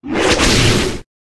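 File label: Two headed Dog attack Mid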